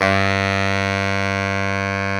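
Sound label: SAX Baritone Sax G 2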